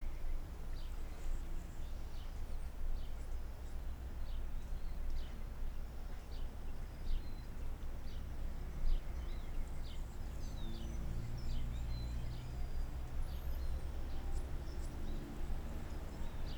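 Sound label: Motorbike ambience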